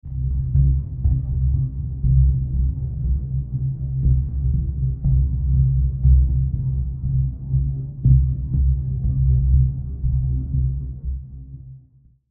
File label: Pulse 90; Interstella